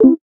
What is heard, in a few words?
list item; ChromeVox Classic Sounds